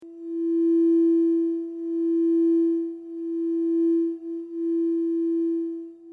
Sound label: crystal singing bowl tone e; chakra3